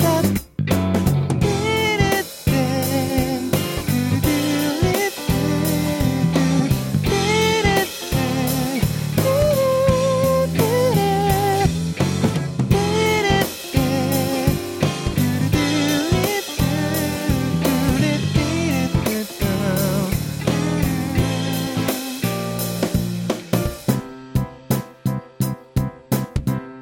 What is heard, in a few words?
second guitar